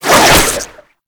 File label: Whip Spin Whoosh; melee; weapons; Oraxia